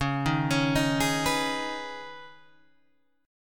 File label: Db 7th Suspended 2nd Db, down, Guitar Open G